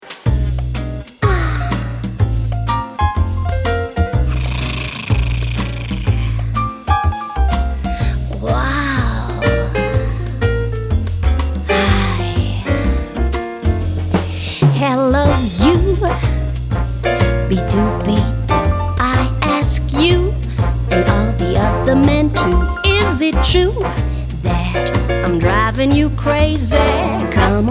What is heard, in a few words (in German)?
Song; Schuh des Manitu